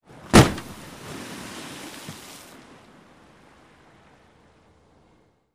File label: Drop Impact Vehicle Dropped Into Water, Shot From 15 Feet Away